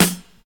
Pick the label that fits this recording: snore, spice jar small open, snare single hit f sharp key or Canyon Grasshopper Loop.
snare single hit f sharp key